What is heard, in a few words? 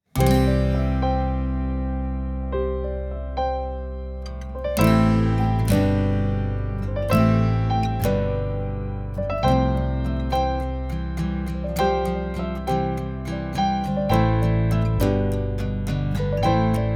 Backing; jardim infancia